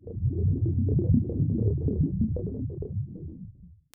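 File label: bubble, scuba diving; Total Control - Action Cutz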